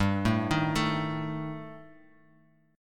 G Suspended 2nd Sharp 5th G, Guitar, Half Step Down